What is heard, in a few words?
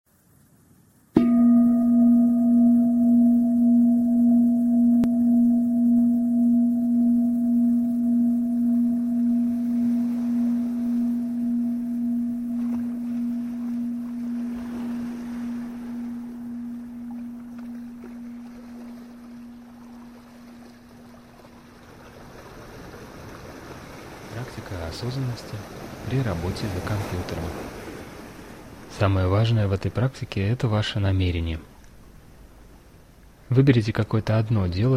ocean waves; Практика осознанности при работе за компьютером 2. Океанский прибой. 35 мин.